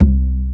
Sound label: Kick; RnB; Takebeat